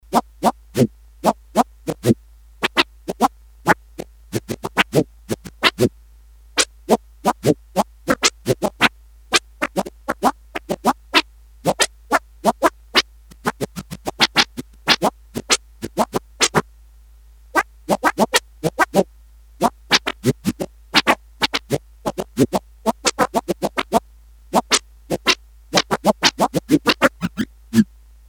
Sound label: Scratching, Korg DS-8; Factory Patches